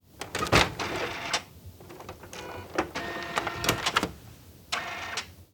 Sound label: Tools and machines Copy Machine Calibration